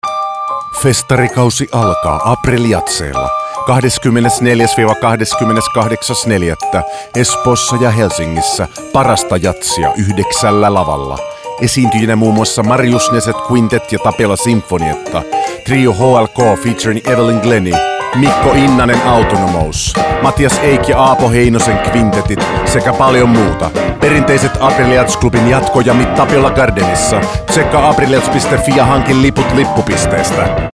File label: Jazz; April Jazz 2019